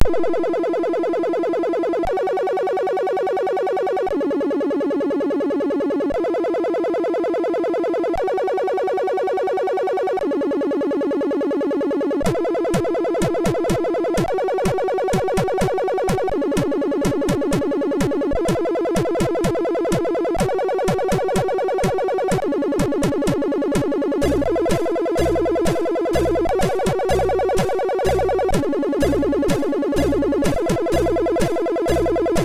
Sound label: sid, MOS6581; hvsc